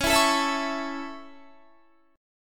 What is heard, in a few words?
Db Minor Sharp 5th Db down Guitar; strummed